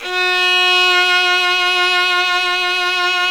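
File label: solo violin, Synclavier Strings; ILIO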